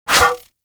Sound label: Disarm Dart Shot; Warframe; Pacifist